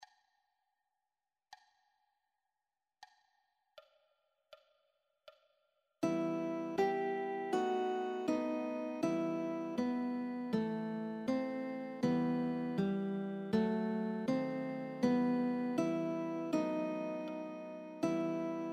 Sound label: old man and the sea gtr